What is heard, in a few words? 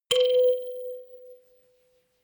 notification kalimba rattle octave high